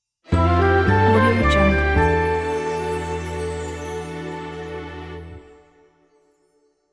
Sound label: jazzy sitcom stinger transition